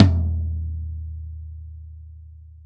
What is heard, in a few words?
tom, velocity tom; VELOCITY TOM 1